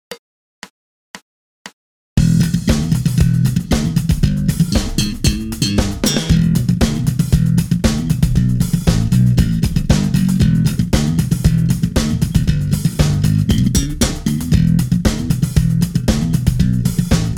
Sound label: slap groove